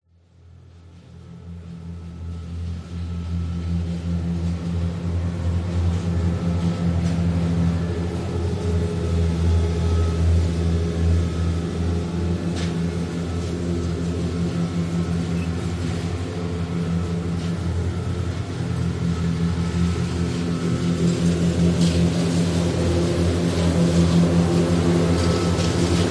grass mower buggy commercial cutting grass distant then close; industrial; zapsplat